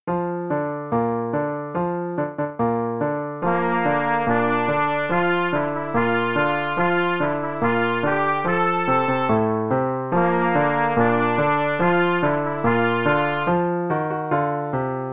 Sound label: On Parade (no click, hn=72), Trumpet; The Really Easy Trumpet Book